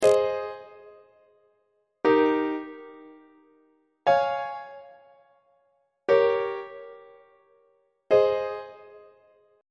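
a flat major leading tone imperfect authentic cadence on treble clef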